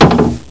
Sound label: door close